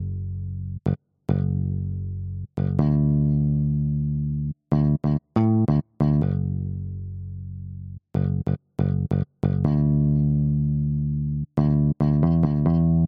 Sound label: bass only